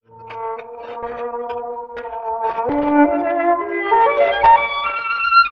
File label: strings, Sad